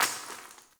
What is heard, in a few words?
Cave Ocean Rock Crumble; Midrath Island